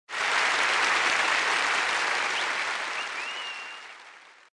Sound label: effect win; Helloween